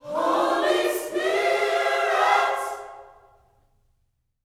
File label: H SPIRIT, Gospel